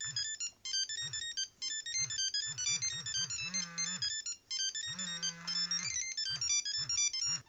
nokia 5510 ringtones tap dance

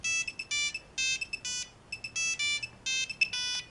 nokia 3210 kick ringtone

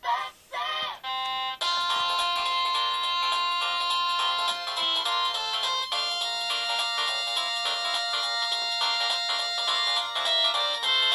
samsung sghe700 ringtone

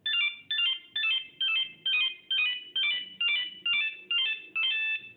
nokia 1100 hopping down ringtone

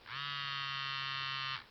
motorola razr v3 ringtones vibe dash

